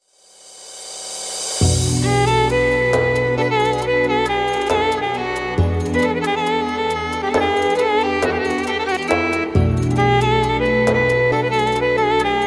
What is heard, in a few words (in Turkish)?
9 8 halk; tyros 4 tyros 3 dugun set